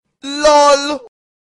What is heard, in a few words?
loltone; Nada LOL